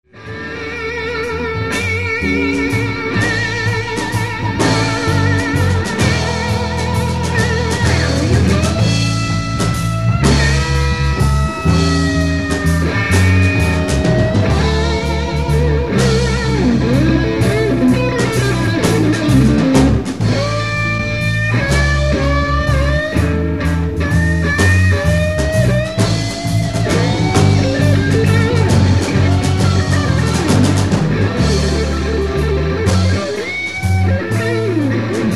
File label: heavy blues